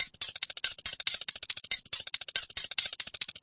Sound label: 140 bpm purified rattle, drum loops and breaks